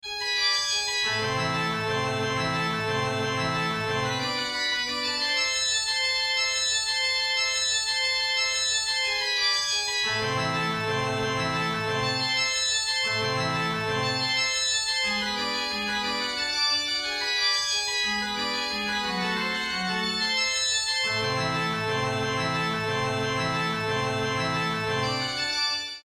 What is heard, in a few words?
light sonification; Works from the students at Quinta do Marquês during May 2024